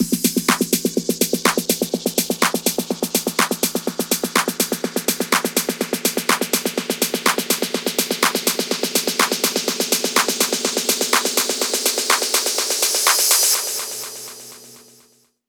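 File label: Build Up Progressive House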